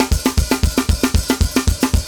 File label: metal